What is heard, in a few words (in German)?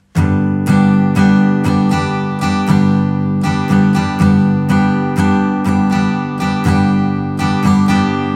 Schlagmuster